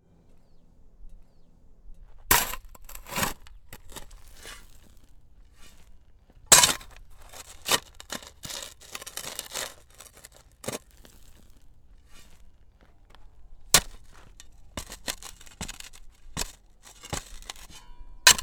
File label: Shovel Digging Sound